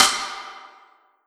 Yamaha RM50 Side Stick

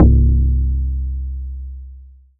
Yamaha TX81Z Timpani

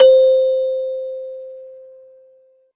Korg NS5R Celesta C5

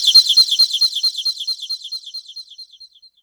Casio CTK-611 Bird

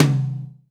Mid Tom

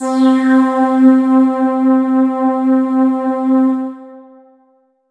Roland JV 2080 Halo Pad C4

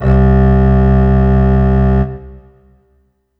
Kawai K5000W Cello C2

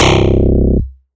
Yamaha TX81Z Sqncr Bass C1

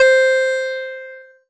Ensoniq ESQ-1 Koto C5

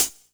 Ensoniq SQ-1 Closed Hi Hat

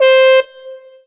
Korg TR Rack Mute Trombone C5

